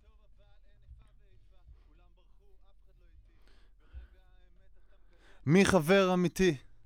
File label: Voc OD